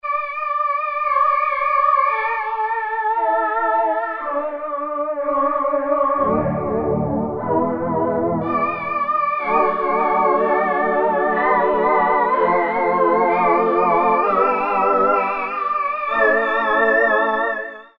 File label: Voices, Roland Alpha Juno-2; Factory B